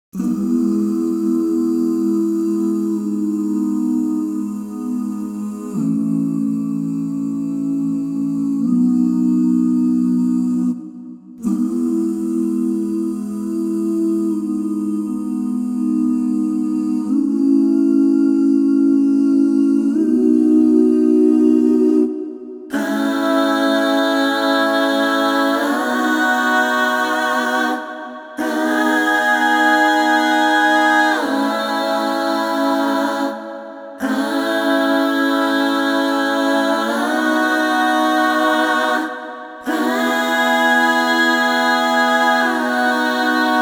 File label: solo